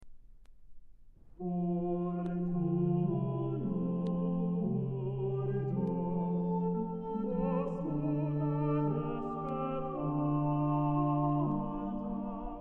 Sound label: Chanson; Antoin Busnois; Fortuna desperata